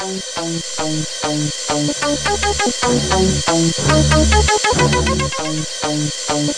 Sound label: DINGO, SYNTH FXS; East West - Global Sounds